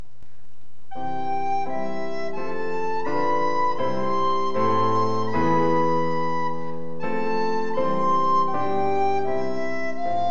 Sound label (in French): choral; hymnes cantiques 168; cruger johann